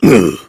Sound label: heavy pain severe